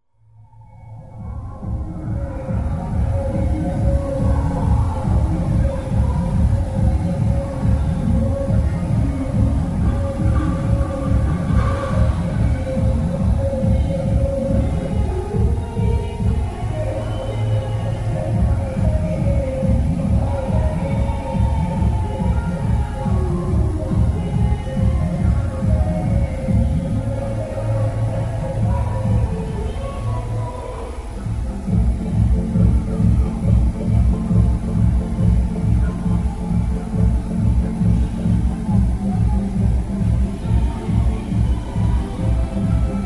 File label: techno pop; radhakund